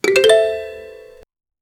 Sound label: short instrumental audio; whimsical